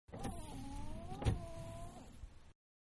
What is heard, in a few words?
Small Car Windshield Wiper Single, Exterior; Vehicles; Car Small-Windshield Wiper-Single, Exterior; Manhattan Production Music